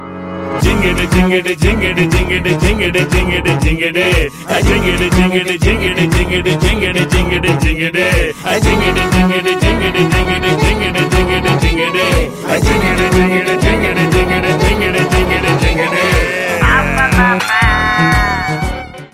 Theme Telugu Ringtone

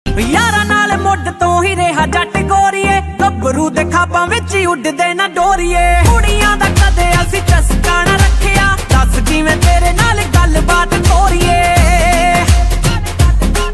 Heart Beat Punjabi Ringtone

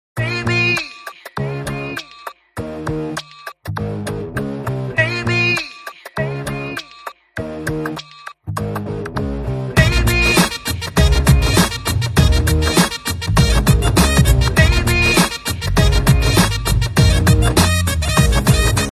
Theme Telugu Ringtone

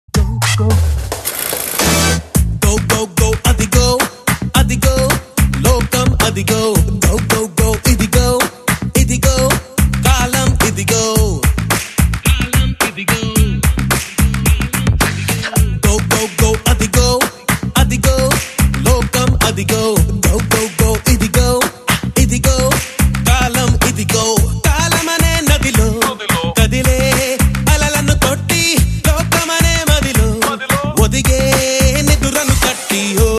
BGM; Sainikudu

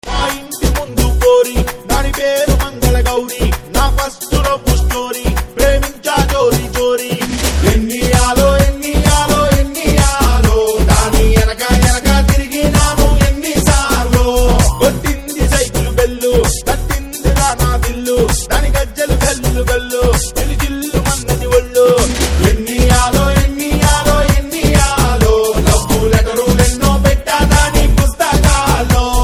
Telugu Ringtone

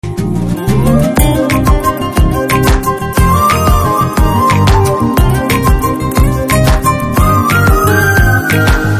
BGM, Telugu; Guna 369

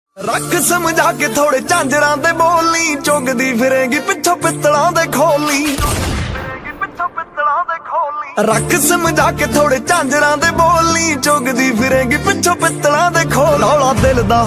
Dance Punjabi Ringtone

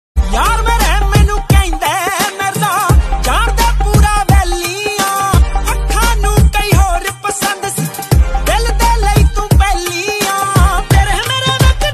DJ Punjabi Ringtone

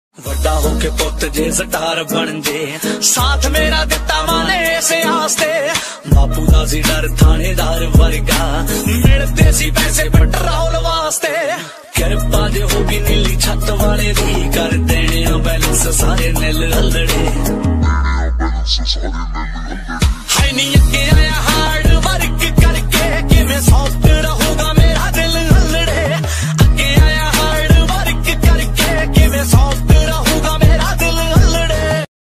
Punjabi Song Ringtone

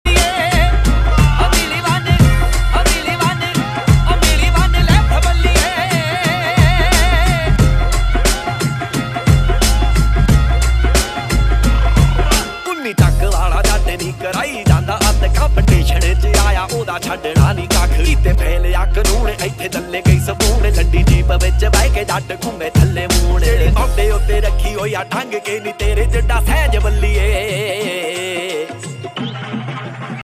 DJ Punjabi Ringtone

